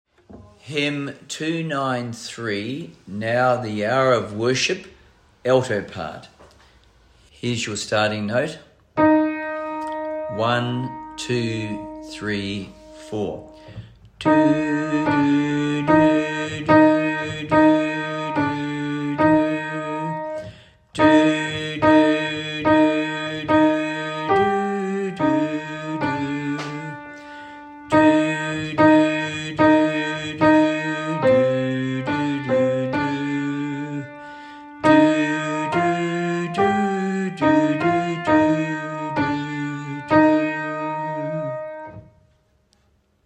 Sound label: Alto, hymns, choir parts; Now The Hour of Worship is O'er; Hymns for Jubilation